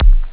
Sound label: drums bass; OctaMED SoundStudio V1